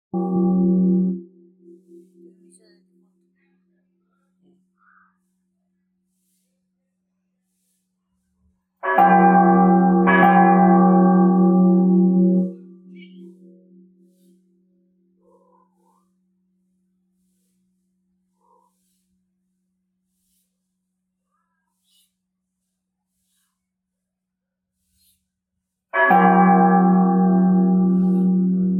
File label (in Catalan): campana